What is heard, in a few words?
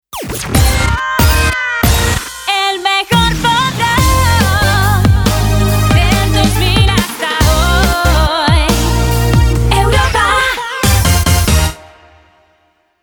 JINGLE EDM NEWS; CREA